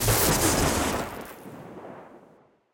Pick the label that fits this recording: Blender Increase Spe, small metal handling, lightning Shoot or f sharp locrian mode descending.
lightning Shoot